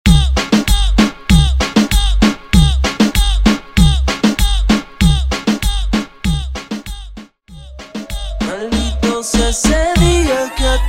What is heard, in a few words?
Version 97 BPM